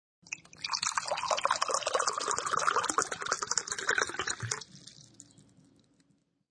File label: Pour Water Into A Glass; Pouring